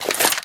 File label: hunting rifle deploy; Left 4 Dead; Source Engine